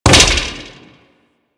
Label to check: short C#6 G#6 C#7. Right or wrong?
Wrong